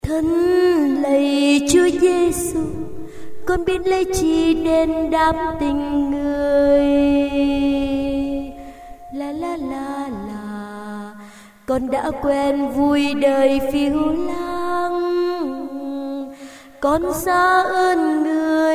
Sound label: alto